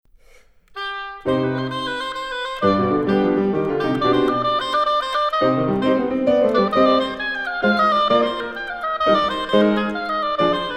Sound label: Sonata in C minor Gigue